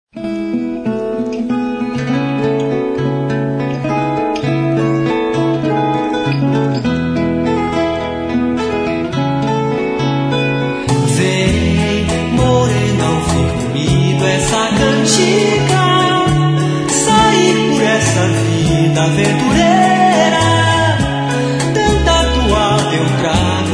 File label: Toada